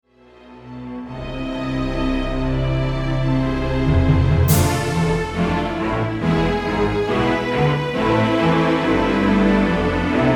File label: orchestral sample